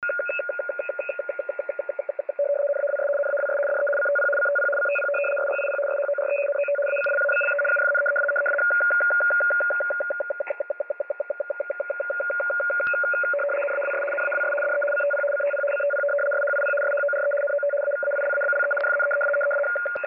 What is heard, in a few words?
noise